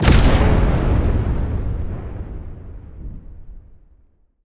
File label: Large Sci-Fi Explosion